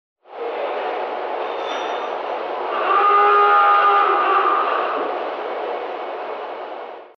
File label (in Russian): zvuk trevogi revuna; Звуки подводной лодки; ревуна